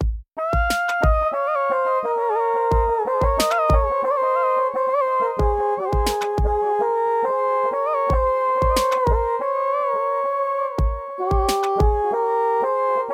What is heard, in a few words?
Hindi Sad Melody